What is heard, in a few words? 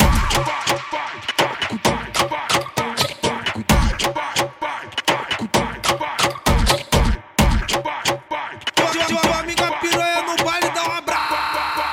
Phonk Music; فانک phonk